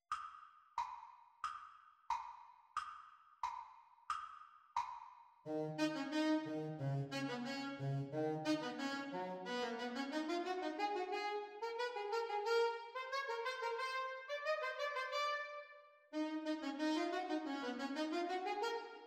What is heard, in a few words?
Sax Duets Playalong; Exam Speed; Alto Saxophone duets; Grade 8.2